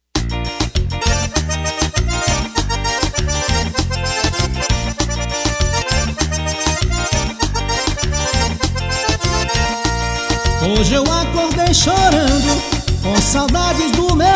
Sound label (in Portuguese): Forronejo